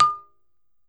BALAFON, African Melodic

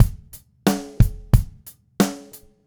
Red Maple Groove 20-inch Kick 90; Sonic Foundry (Sony Creative Software); Vital Drums

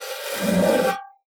OXACA SKIN, World & Orchestral Percussion; ILIO

